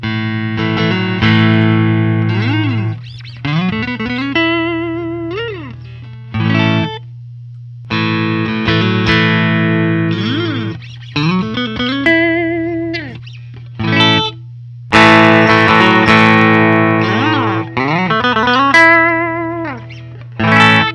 hahn 1229; natural brown

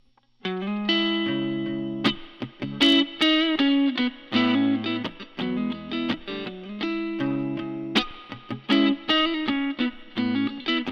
ronin morningstar; desert sand

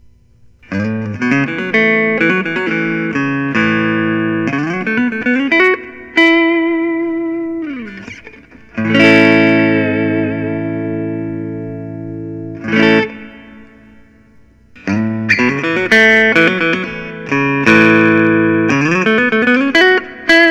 paul reed smith modern eagle 1 tiger red

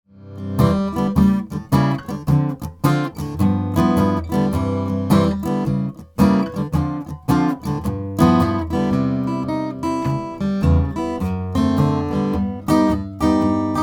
Kraut modified d brazilian rosewoo

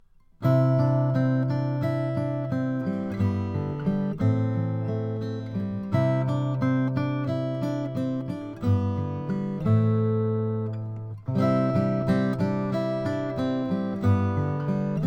kostal 00 mastergrade koa

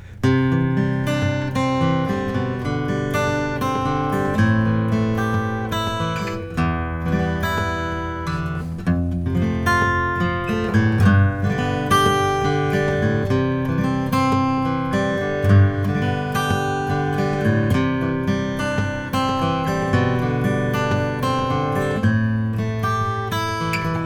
martin 000 42 authentic natural

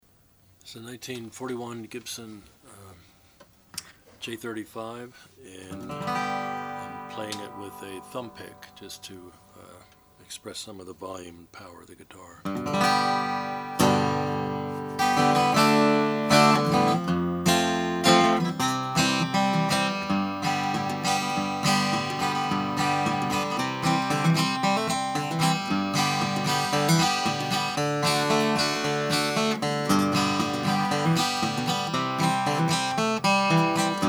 gibson j 35 cherry sunburst

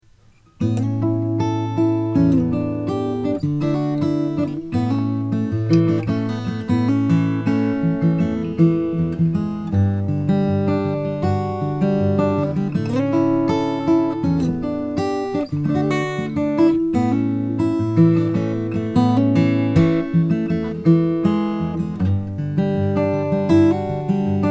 taylor 812 indian rosewood

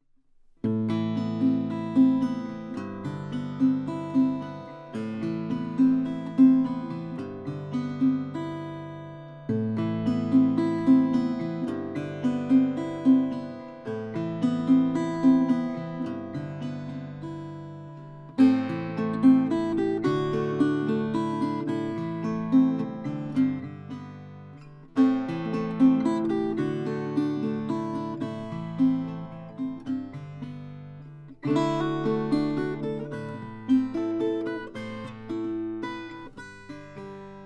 fraulini erma varnish finish

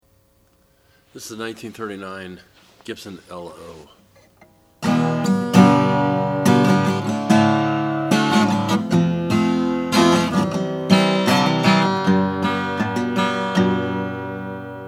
gibson l 0 black

